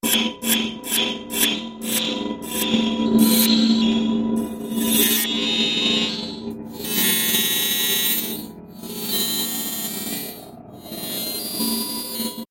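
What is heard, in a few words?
endings outro, disappearing fading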